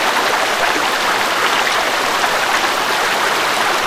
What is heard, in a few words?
rushing stream; source engine; left4dead2